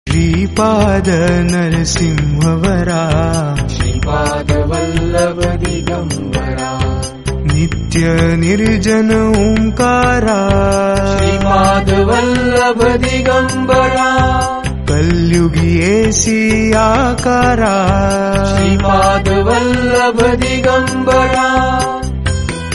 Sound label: dattatreya mantra; om dram dattatreya namah